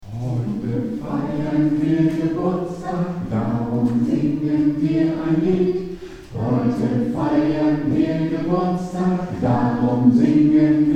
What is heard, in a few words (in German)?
Geburtstagslied